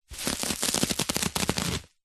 office packaging bubble wrap roll rub finger run through layers